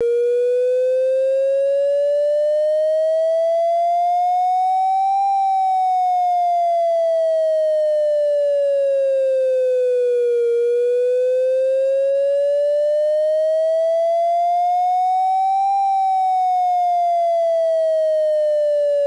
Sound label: siren test sound; suncor